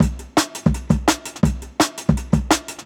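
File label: Jungle Breaks, 168 bpm; Original Jungle Breaks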